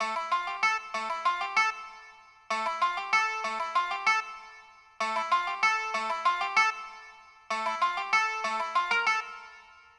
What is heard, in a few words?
Baglamas, Hip Hop Exotica, 096 Amin